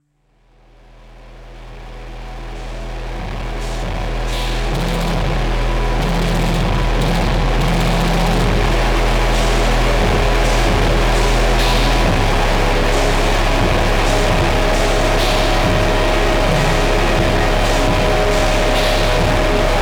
Robot Chant

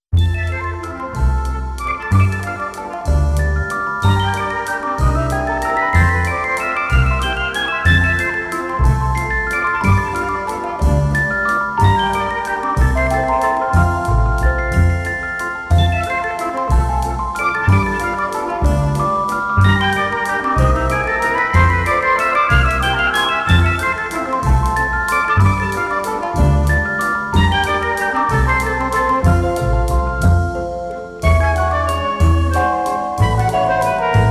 Waltz

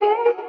Vocal Hit, Dancefloor Pop; Remixlive

